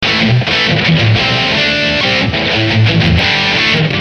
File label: rhythm 124